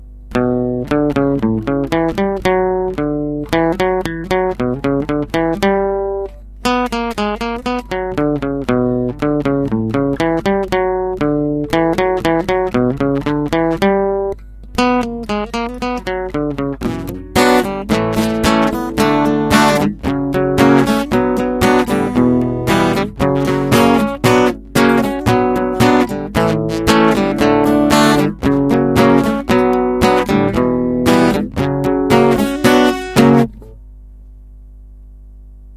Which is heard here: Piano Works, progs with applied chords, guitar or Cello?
guitar